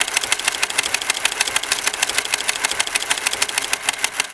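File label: airport departure board right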